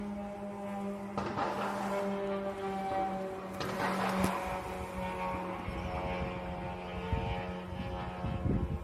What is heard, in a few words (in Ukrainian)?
Звук Шахеда